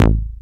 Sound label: attack, BASS, Techno Trance; Techno Trance Essentials